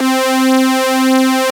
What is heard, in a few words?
Saw Punch, Analog, Synth Lead; Ableton; Factory Packs; Synth Essentials